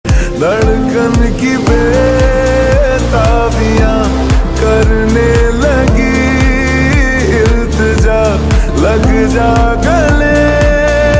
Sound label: lofi remix slowed reverb